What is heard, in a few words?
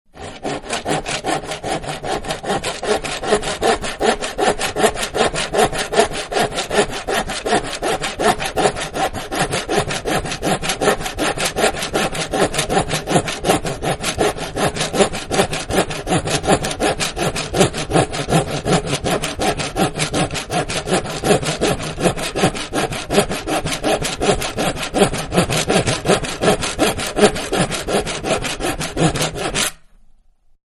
Hand Saw Sawing Wood; Machines; Manhattan Production Music